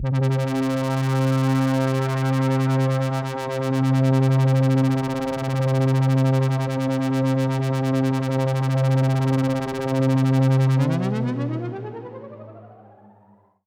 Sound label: Pad C, Synth Prophet One Shots; Electronisounds